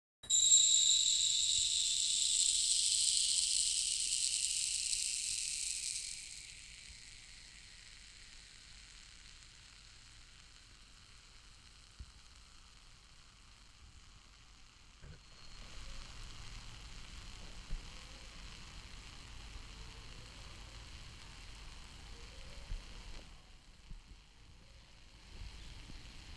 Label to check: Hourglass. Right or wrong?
Right